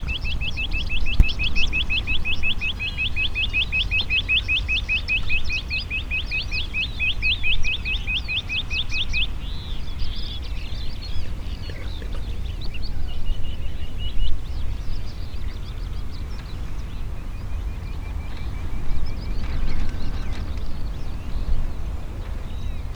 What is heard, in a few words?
Rock Bush quail